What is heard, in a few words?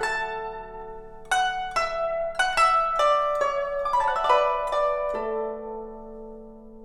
Guzheng 70 bpm D